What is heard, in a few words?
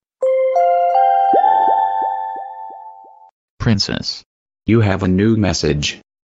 New Message; Princess